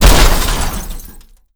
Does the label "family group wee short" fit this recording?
No